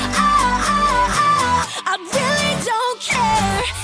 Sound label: whoop